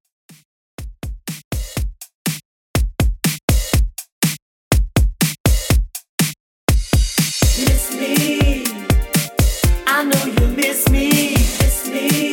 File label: Classic Mix 122Bpm; djyan